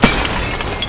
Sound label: debris; Gunlok